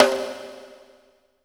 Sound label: HIT LONG, PROC SNRS; Sampleheads; New York City Drumworks VOL-1